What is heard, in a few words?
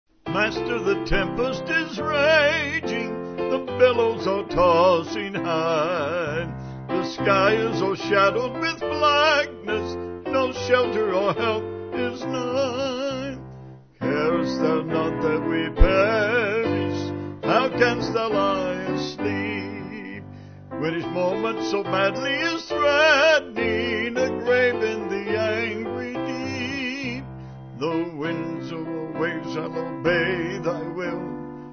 Vocals Piano